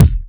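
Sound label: kick, Boss DR-202; Drums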